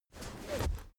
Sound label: car armrest backseat close fast